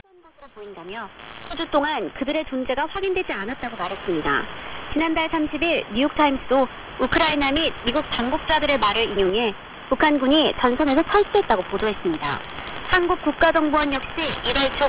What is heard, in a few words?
Time Signal Station; 10000,0; Associazione Amici di ITALCABLE